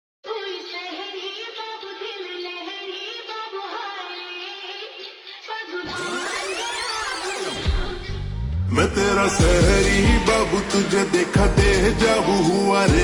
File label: Slowed Reverb